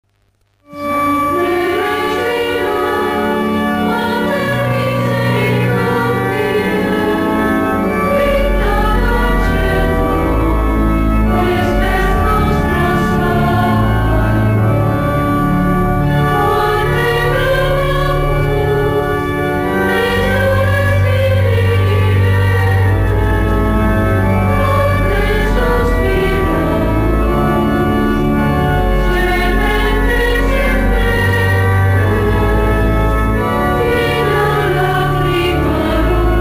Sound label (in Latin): Salve Regina (latino)